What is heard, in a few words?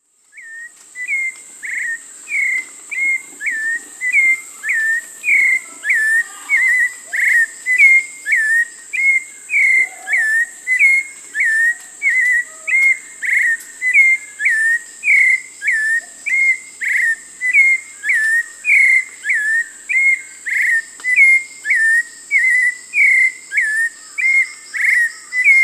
Hauxwell's Thrush (Turdus hauxwelli); S. America